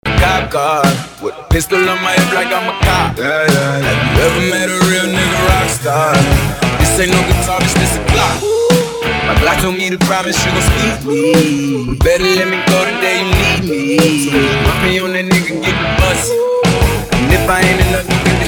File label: Hip Hop Rock Mash Up